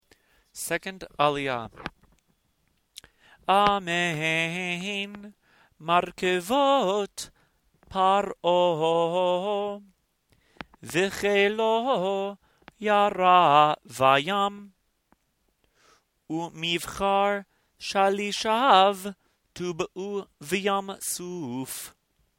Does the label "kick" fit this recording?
No